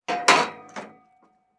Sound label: Metal; TOOLKIT vol.2